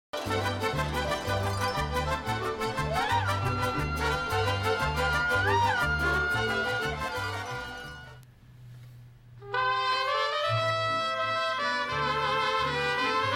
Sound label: Mariachi